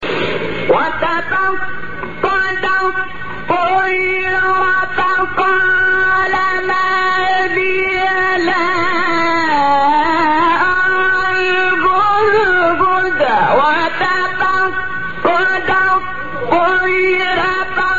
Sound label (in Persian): تلاوت از آیه سوره مبارکه; استاد شحات محمد انور; نمل